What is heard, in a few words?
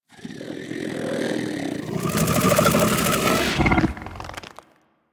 Bug Vocal Snore; characters; Warframe; Hunting Critters; Inf K Drive Bug